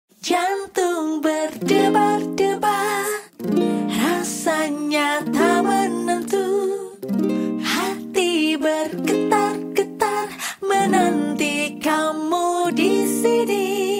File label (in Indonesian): jantung berdebar debar